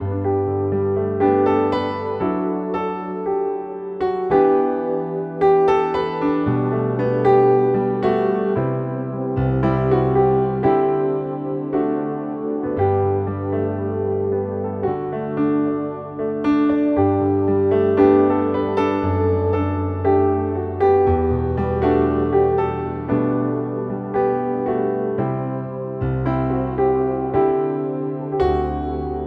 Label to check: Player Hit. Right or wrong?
Wrong